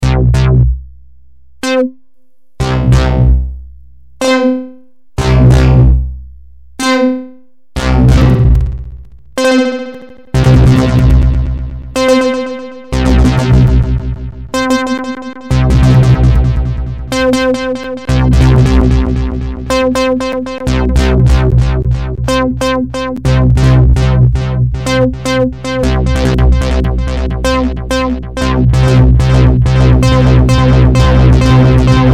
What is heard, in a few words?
delay